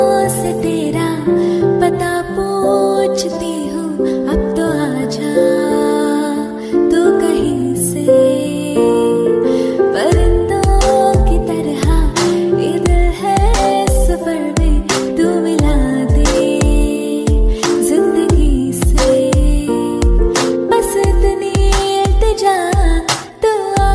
bollywood sad song ringtone

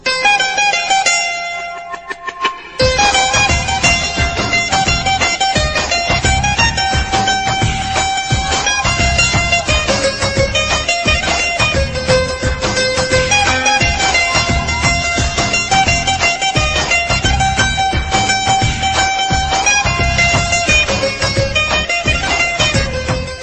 nice guitar ringtone

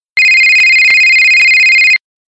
cell phone ring ringtone; iphone

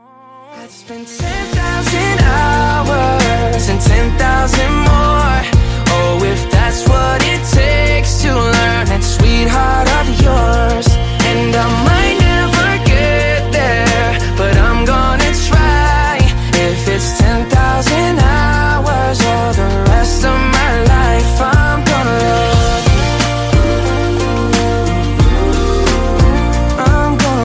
sweet ringtone, english ringtones

